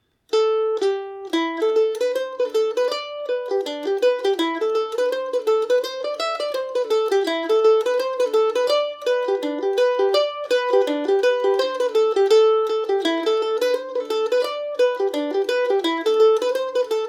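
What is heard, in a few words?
dan molloys A dorian, mandolin; Kentucky KM1000 mandolin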